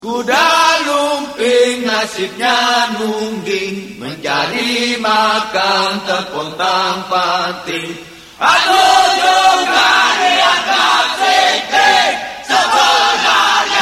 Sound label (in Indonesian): kuda lumping